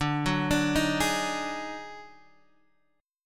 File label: Eb Minor Major 11th D